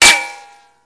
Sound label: metal; Mafie 1